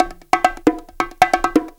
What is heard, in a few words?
BONGO CONGA LOOP